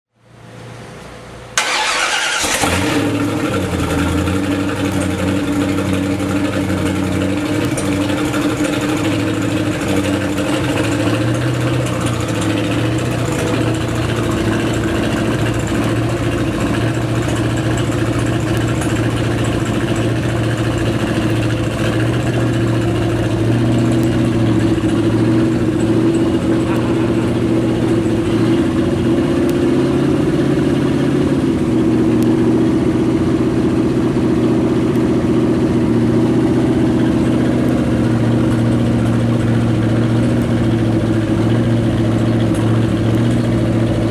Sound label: v8